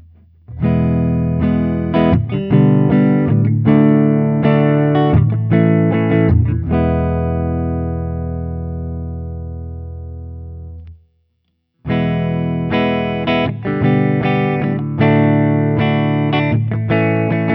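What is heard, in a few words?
Guild X700 ODS100 Open, guitar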